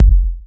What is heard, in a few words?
BD; Best Service; Dance Mega Drums